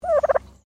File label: Bird Turkey Domestic Call; Birds; Animals